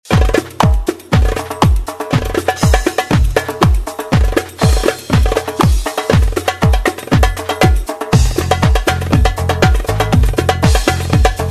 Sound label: darbuka show mix